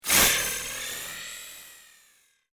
Sharp Sparkle Whoosh; Whoosh Sound Effects

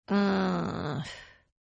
Groan Female; Groaning; Voice

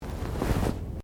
Body movement Clothing Foley

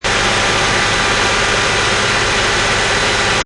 Mustang V6 Loop Rpm 3000 Engine Perspective; Car Sound Effects

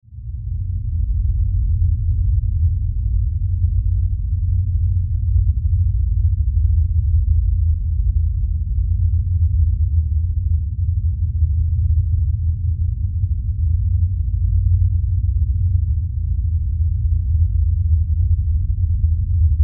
Sci Fi Spaceship; Spaceship Hover Hum Mother Ship Low Rumble; Spacecraft

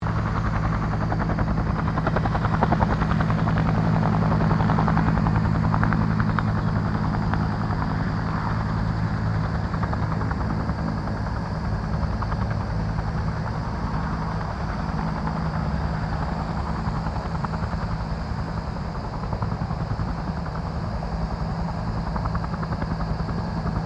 HELICOPTER 1967 BELL UH1 IROQUIOS PASS

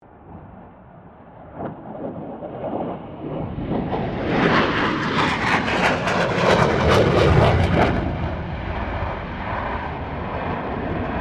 AIRCRAFT FA-18 HORNET PASS

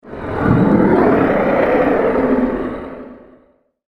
Deep Gust Of Wind; horror sound effect; Andrew Fleming

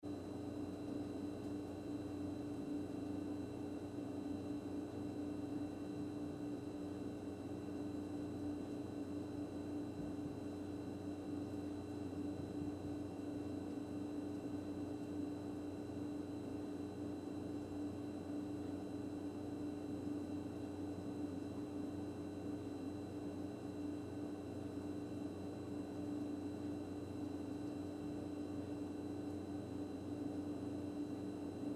Fridge Kitchen Hum Roomy; Appliances; Household; Big Room Sound

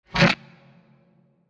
Short Science Fiction UFO Spaceship Flyby; Crafted Signals

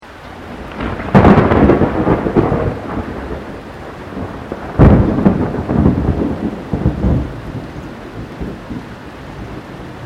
THUNDER CLOSE RAIN; Elemental weather effects

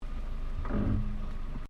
Car Toyota Starlet brake; Toyota hire car in Japan; Cars; Vehicles

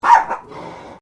Animal Dog Chihuahua Bark Single Snarl; Newcastle; Various domestic dogs; Dog Sound Effects; Dogs (Sound Effects:Animals); Foundation1

